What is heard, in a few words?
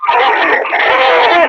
Enemy Death; Warframe; Lasrian; Battle Barks